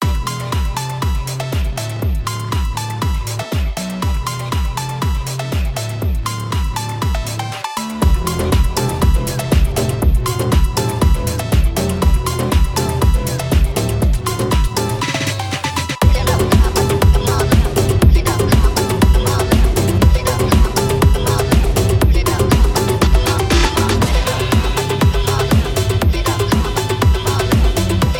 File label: phonk